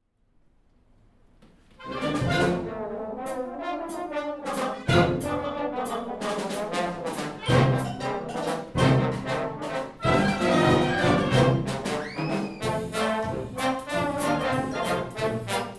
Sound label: Trombone Rag